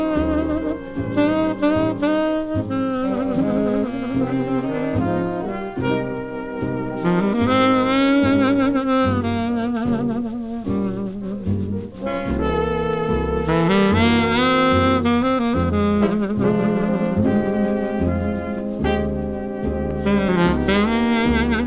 jazz

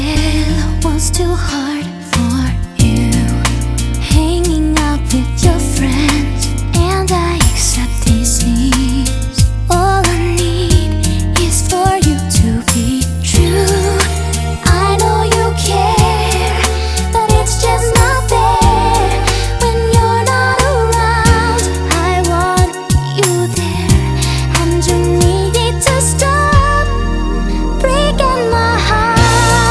pop

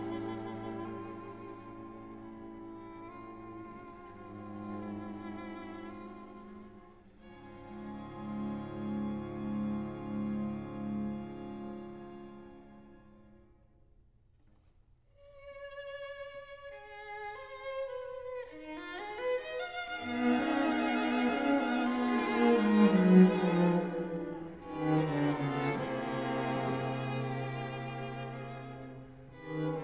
classical

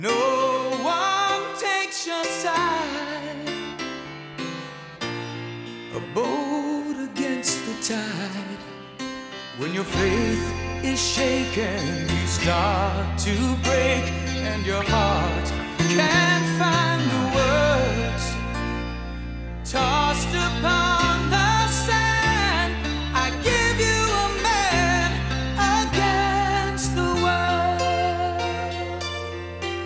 rock

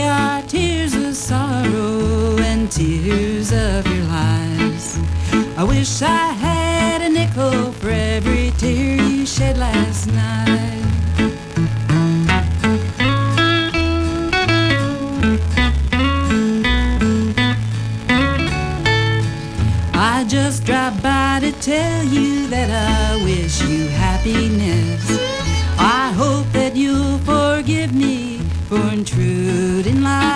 country

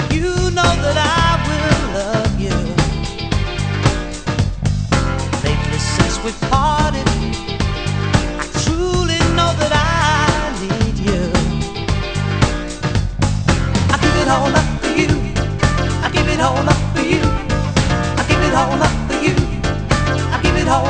rock

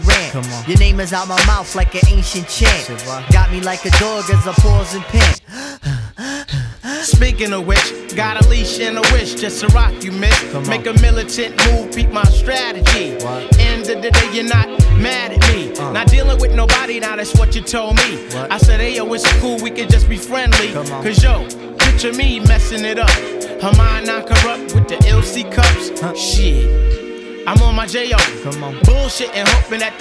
hiphop

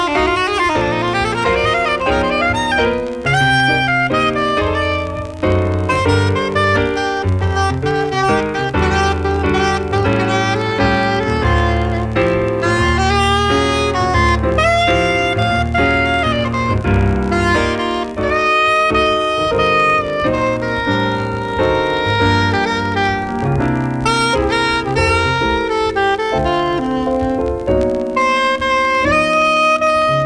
jazz

